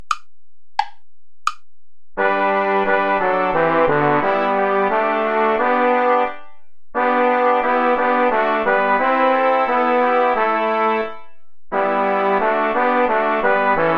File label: play along without trombone; goudimel claude; lobt gott den herrn der herrlichkeit